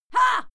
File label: female fight scream short; schreie; menschen